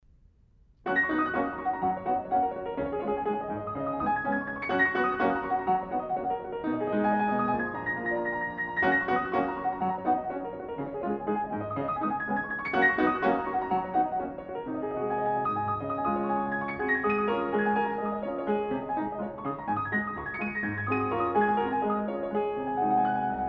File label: Etude for piano in G flat major; Chopin; Etude for piano No. 5 in G flat major ('Black Key') Op. 10-5, B. 57-1